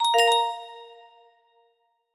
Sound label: text tone, music box melody